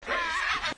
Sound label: Strange Sound; VC GhostHunt 2008; EVPs; Camera EVPs